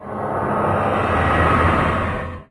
cave hit, ambient atmosphere; source engine; hl2; video games

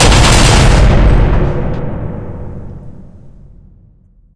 crusher smash; hero; Source Engine; Portal 2

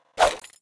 shovel swing; tf

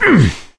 melee swing, gambler, survivor voice

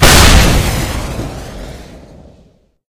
rocket shoot, weapons; blackbox; tf

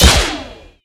crit hit; video games; source engine; tf

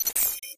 node locked; ui

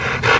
barnacle pull; Source Engine; video game; npc

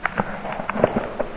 te move, tentacle; source engine; hl1; video games

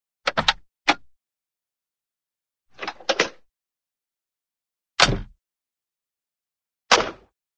auto, door open; cartoon

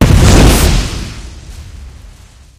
spell fireball impact; halloween; video games; source engine; tf

